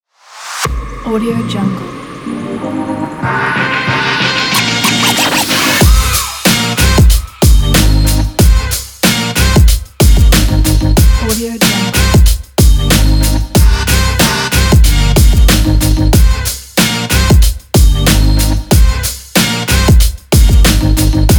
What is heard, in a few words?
hip hop